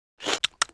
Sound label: Sfx Eat